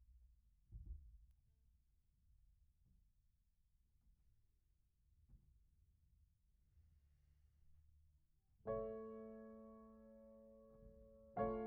Piano Mono

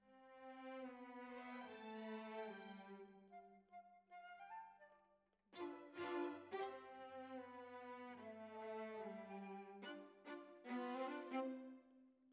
Violas, jazz for piano

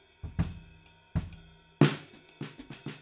Ride

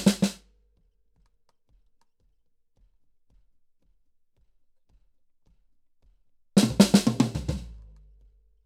KIT

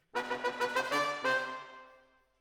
Horns Over

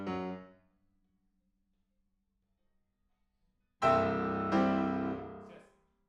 PIANO

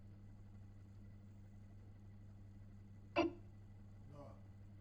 Hammond Leslie

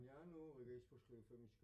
Piano A

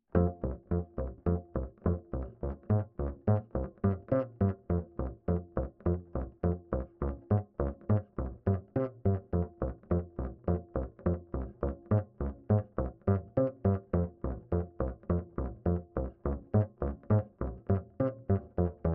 Bass Line

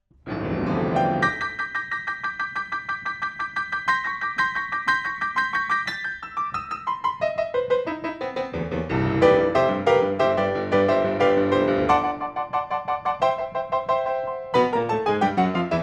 PIANO, RNR for piano

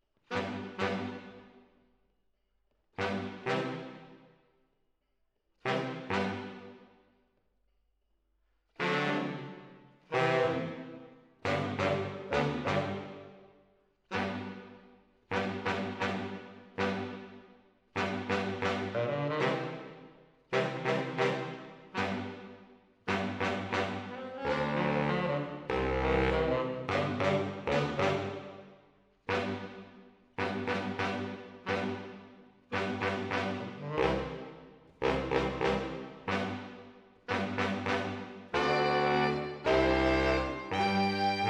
MKH AB, piano

